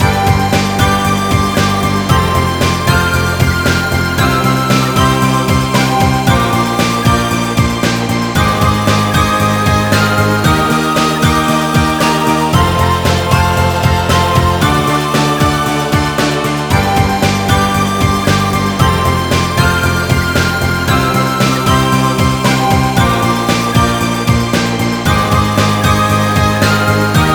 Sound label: Victory song; American Dragon Jake Long Attack of the Dark Dragon